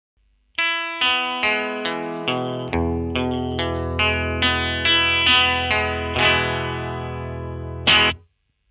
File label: jag 1963 bridge